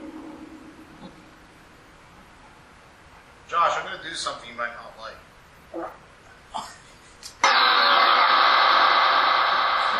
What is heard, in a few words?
Auditorium Phasma Box